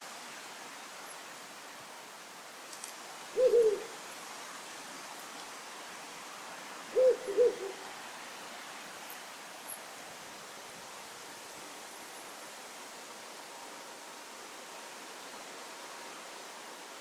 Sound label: ural owl short